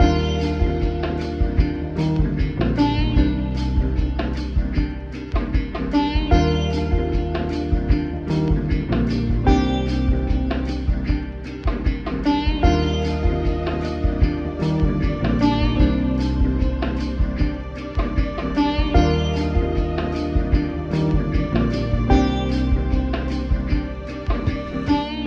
guitar, Loops

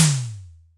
Toms Electro

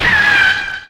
fall, Stabs

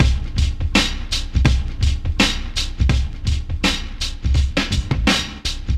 Drum loops Boombap

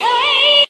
"hey", SUNG

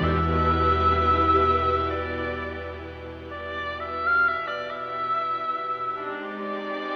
strings broken hearted, Sad; Loops

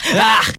"ah", Voice

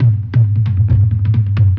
big band; Drum loops

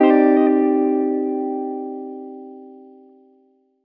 plucka, Guitar; Notes

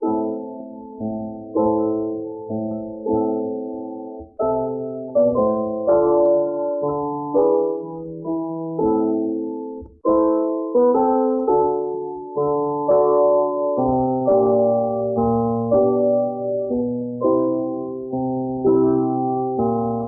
piano somber move; Loops; Sad

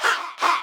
ha ha

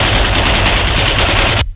machine gun short; Crash Bang Boom

